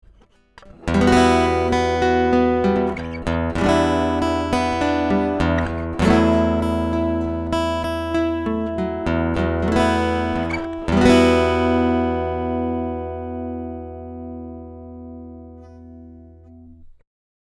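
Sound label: Rupert Neve Designs Portico 5017 Steel string DI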